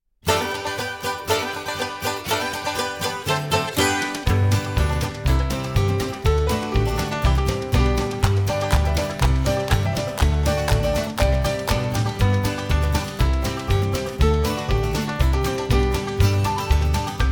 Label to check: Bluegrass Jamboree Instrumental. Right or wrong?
Right